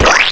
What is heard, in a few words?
Pie Splat; Free Hearts